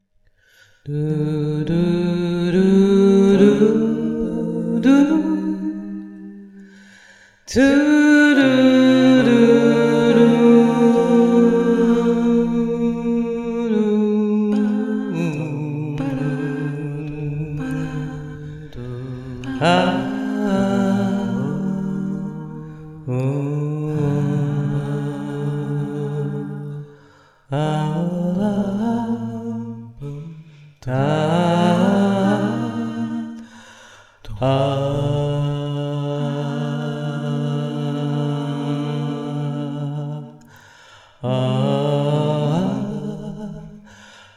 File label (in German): Feierabend Klang